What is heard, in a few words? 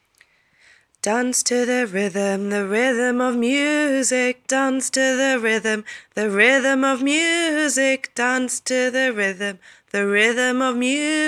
dance to the rhythm